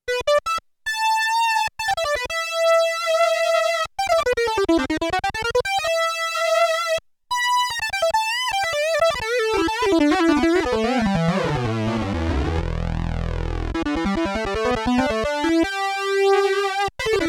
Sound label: LEAD SYNTH, SIEL DK 80; Factory